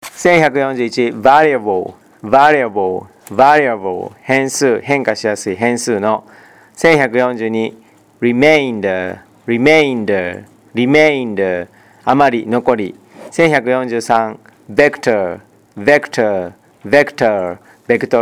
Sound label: tango